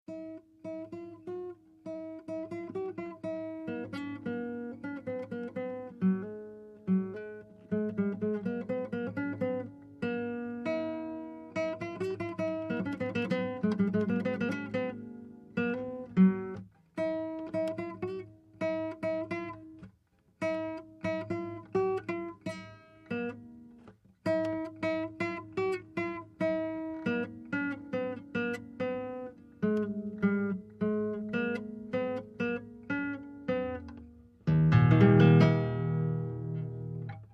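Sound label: Acoustic Guitar Practic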